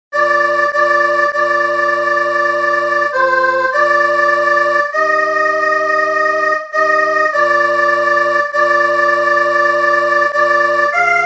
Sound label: soprano; Confitemini Domino